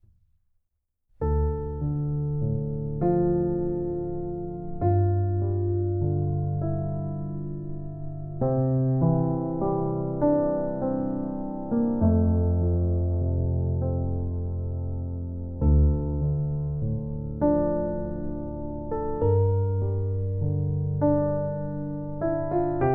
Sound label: Sleepy Piano Crickets; SLEEPING PIANO